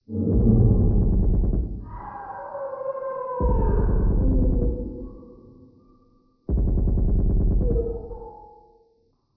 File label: distant fighting